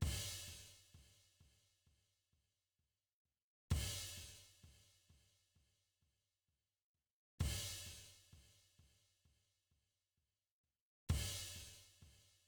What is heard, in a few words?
Drum Loop, 130 BPM Open Hat; Loop Stems; Cymatics; Vibes II Drum Loop Collection; Genie Drum Loop